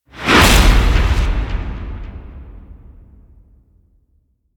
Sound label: Deadly Hit Swell; Ninja Tracks